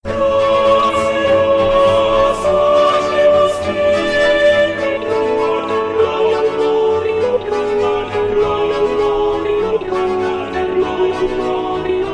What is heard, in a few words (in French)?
Gloria sop; Stage Zelenka 2023; zelenka zwv21